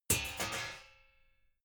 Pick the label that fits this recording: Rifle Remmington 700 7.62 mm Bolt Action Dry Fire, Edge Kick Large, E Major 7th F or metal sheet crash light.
metal sheet crash light